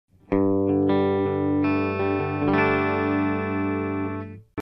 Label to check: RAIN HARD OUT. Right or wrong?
Wrong